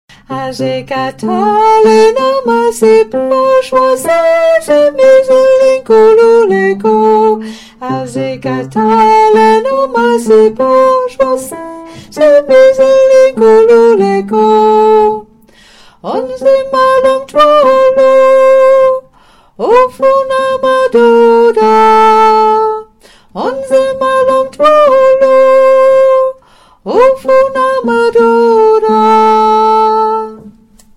Tenor; Asikatali

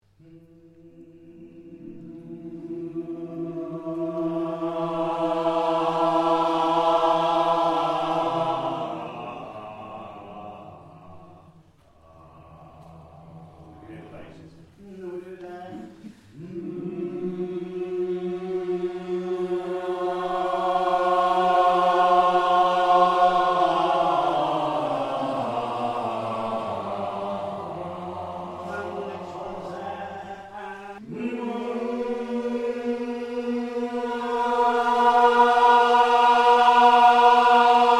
Mmmm Warm up

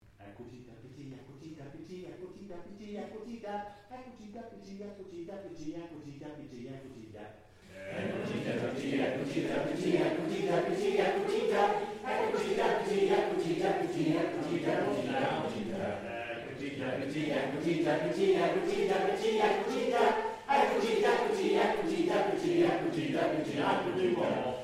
Warm up

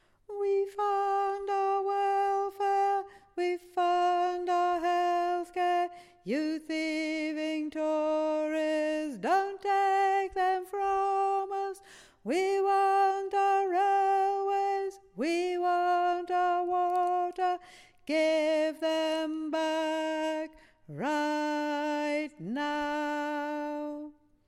Tenor

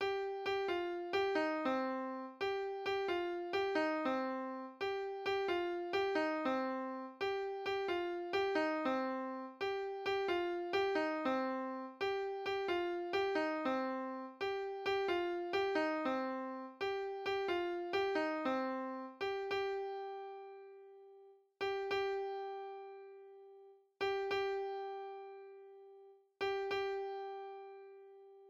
Midi; Extinction Rebellion

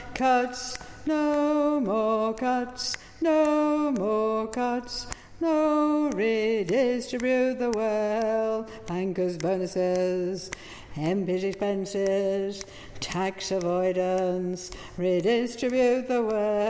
Tenor

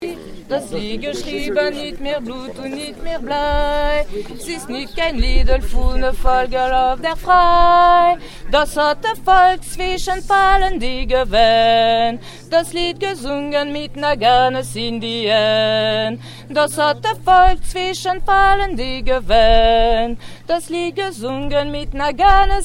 German Bass

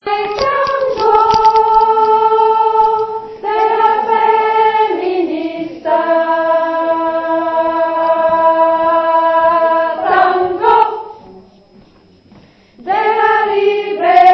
Tango Sop

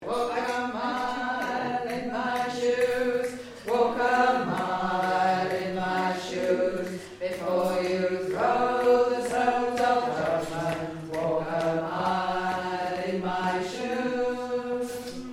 bass

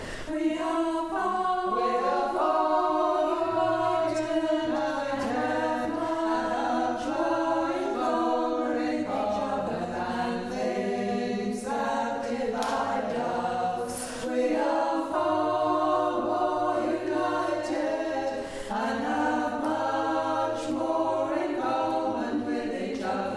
tenor

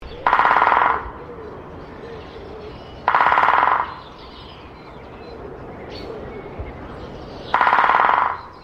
Great Spotted Woodpecker, birdsong

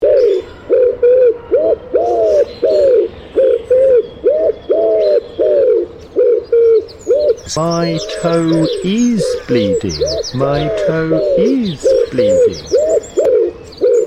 Wood Pigeon

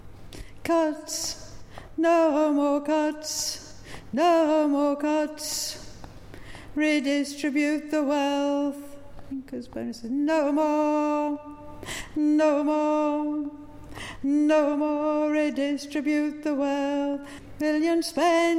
Alto

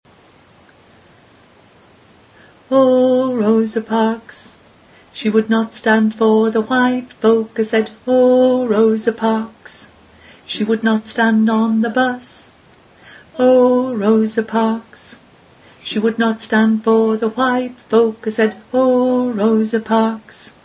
tenor

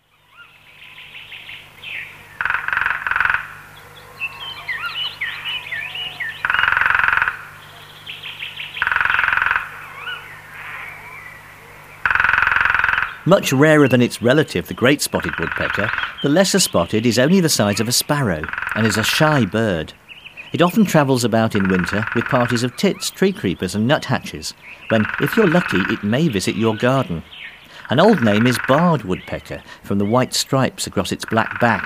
Woodpecker lesser spotted - Pivert; bbc Bird Song Site

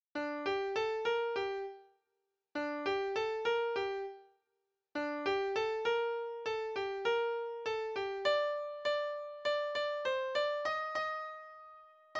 Midi sop; bella ciao we are singing

